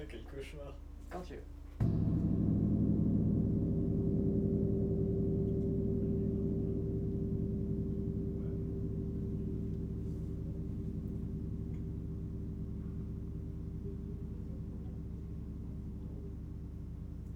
Grand pianissimo, GONG VIBRATION

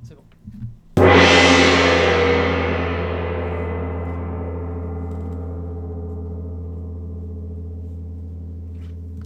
petit test, GONG VIBRATION

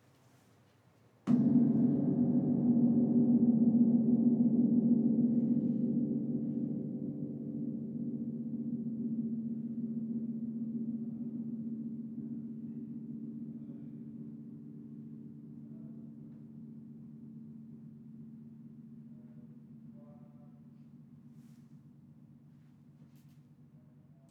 Très doucement, GONG VIBRATION

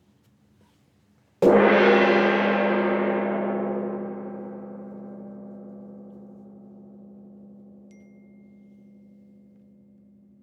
petit 1 coup loin haut, GONG VIBRATION

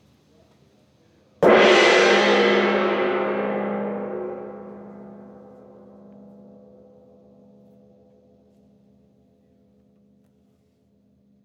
petit 1 coup loin bas, GONG VIBRATION

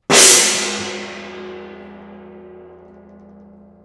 fort, gong vibration

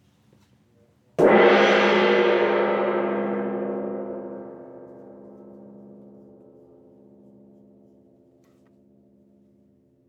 petit 1 coup loin gauche, GONG VIBRATION

